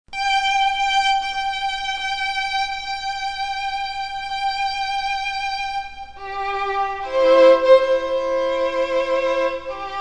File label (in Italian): Marcia nuziale violino Lohengrin; basi musicali professionali per matrimoni